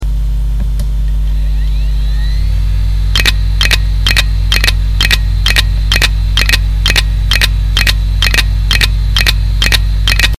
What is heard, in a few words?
wd 10GB PCB dead; Почему у специалиста по восстановлению данных должен быть хороший слух?